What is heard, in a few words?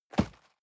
box hit medium